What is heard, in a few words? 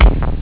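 bass drum; Amiga Soundtracker Sample Packs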